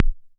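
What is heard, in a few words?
kick, Moog Minimoog Drums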